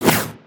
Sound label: Brick Whoosh